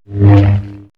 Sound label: saber miss